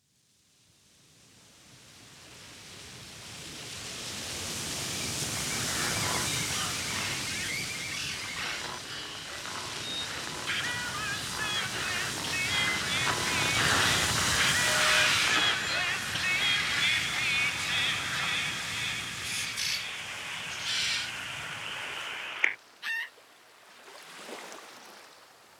transition tuning in

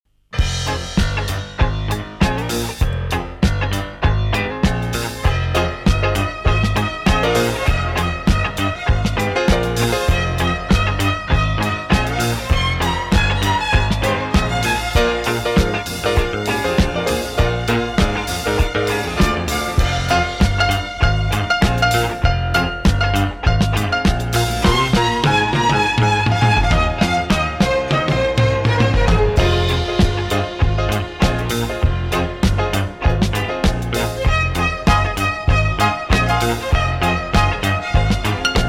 instrumental disco

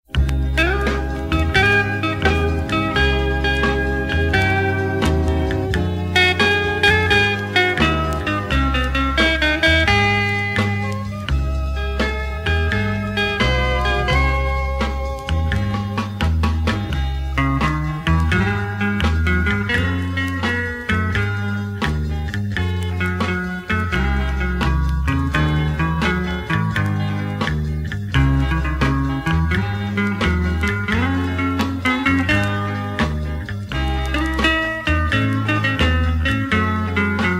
guitar instrumental

